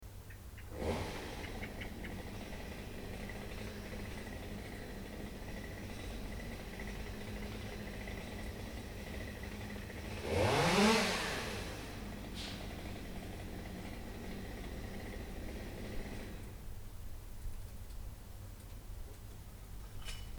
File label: chainsaw